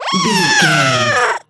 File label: goblin fairy growl